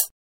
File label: High, metro